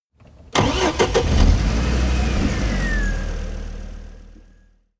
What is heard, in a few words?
car car start